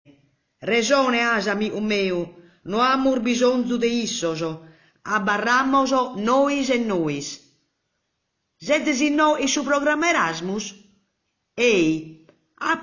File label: Sardinian Orgosolo; Giga Sardinian